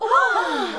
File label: NOISE, CLASSIC VOX; Best Service; Hallelujah